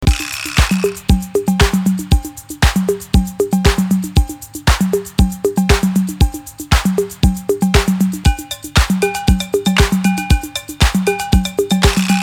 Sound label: Disco